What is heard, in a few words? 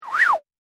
flying prop